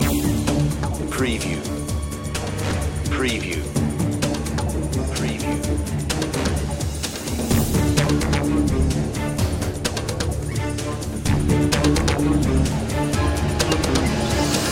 digital voodoo loop

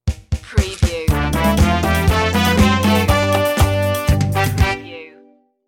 cafe noir stinger

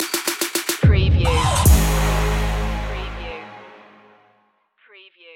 808 boom stinger

